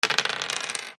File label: dice roll